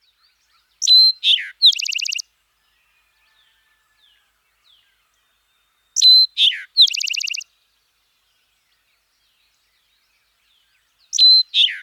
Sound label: Eastern Towhee Song